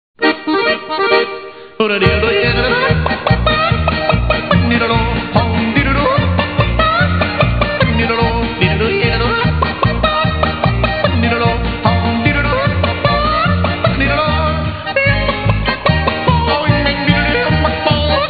Chicken Yodel, Old Country Music

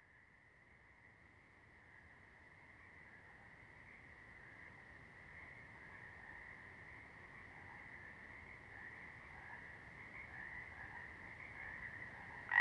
small green froggies; Nature Sounds; Sounds of Nature Collection

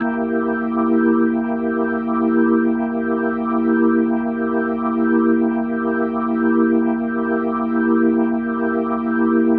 Medical Organ C7; Dominant 7; The Vitale Organ

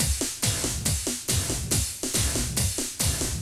techno 140

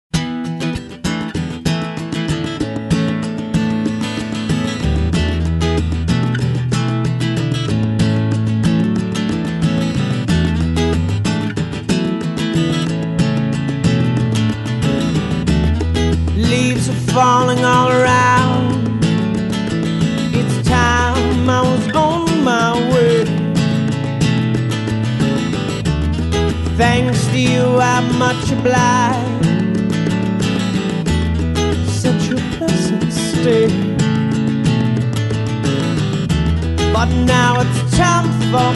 acoustic

